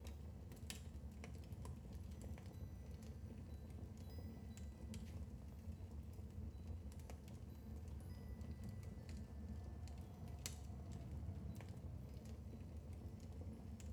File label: santa house; new year